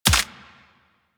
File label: Bullet Impact Head Hit Notification; Warframe; Weapons